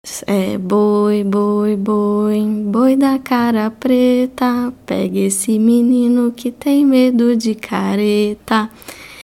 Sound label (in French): Chant; ABBAYE